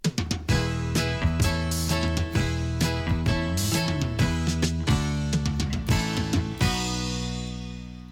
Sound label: topformat maxi jingle single